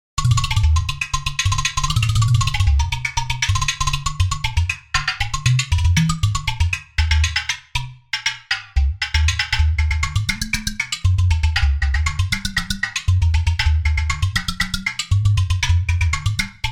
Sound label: World Percussion